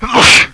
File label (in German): niesen